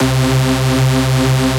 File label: Techno Synth Shot C; Synth One-Shots; Ghosthack; 45 Free Techno Synth Shots 2025